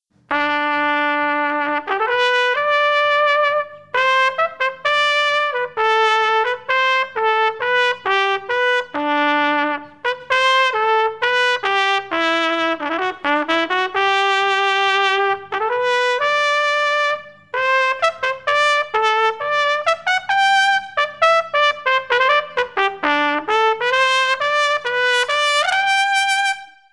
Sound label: TRUMPET